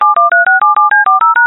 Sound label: phoning; electric